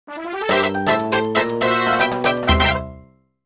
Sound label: level clear